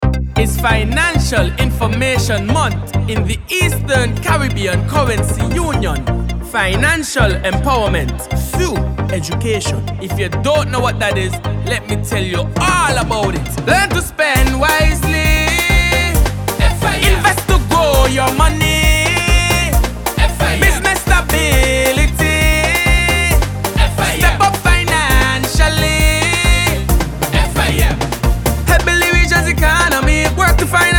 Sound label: Jingle; ECCB Financial Information Month